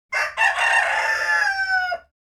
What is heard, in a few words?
Rooster crowing